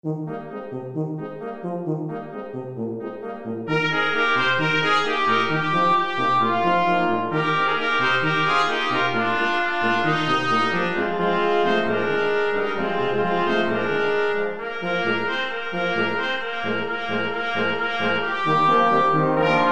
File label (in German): blechblasquintett